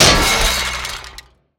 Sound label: metal impact; soundscrate